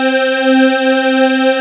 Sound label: PAD C4, SYN PAD; MKS